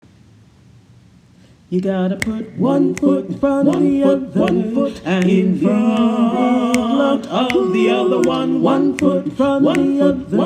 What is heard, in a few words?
One Foot Chorus; OaxacaCanta